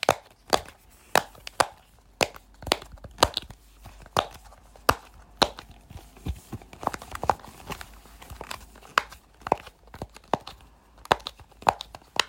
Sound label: fidget dimple; Golden Crayon Sounds